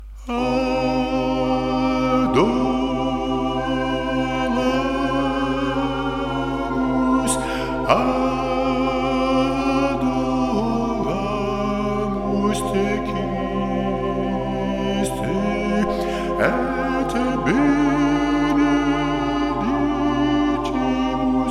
Adoramus te, choeurs; Gasparini

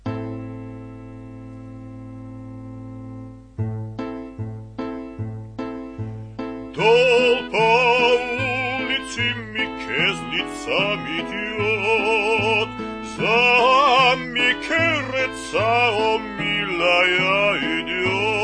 Te pastoi solo

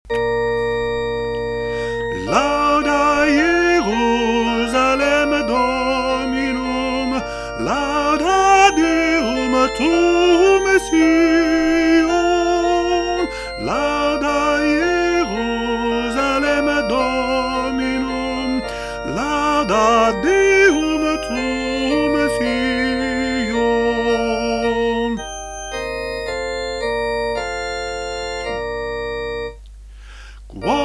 Lauda Jerusalem solo

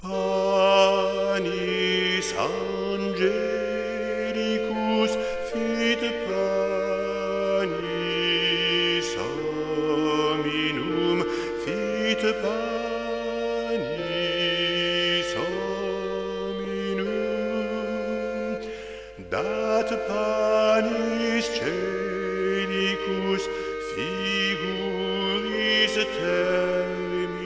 Panis angelicus; Casciolini

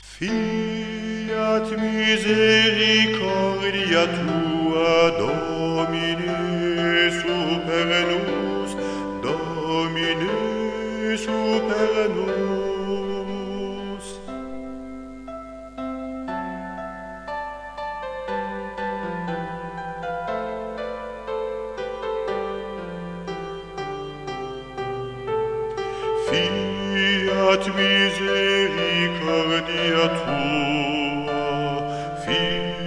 Te Deum; Charpentier

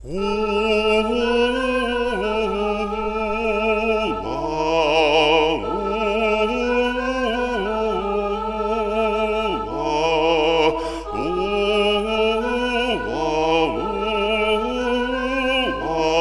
Vocalise

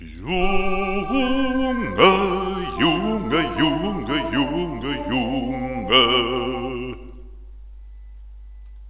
Vocalise

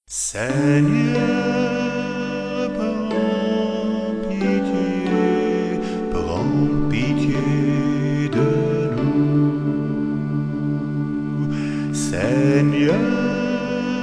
Kyrie solo

